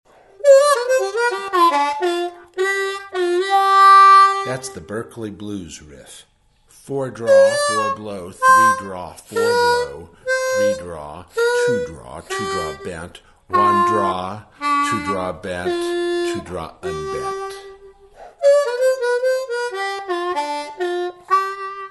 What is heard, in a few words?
Blues Riff, harmonica; Berkeley